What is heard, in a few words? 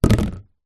tub ice cream empty lid down into tub; foley